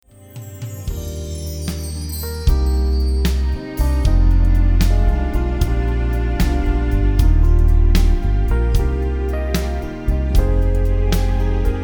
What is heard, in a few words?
big snare